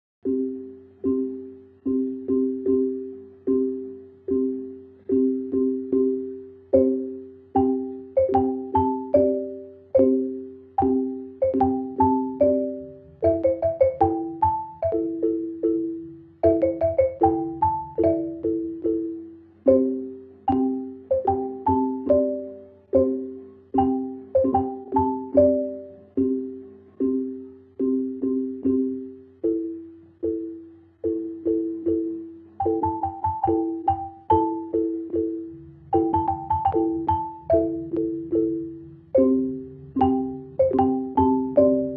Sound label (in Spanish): XILÓFONOS